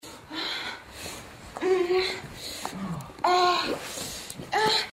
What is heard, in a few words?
sex; Low Blow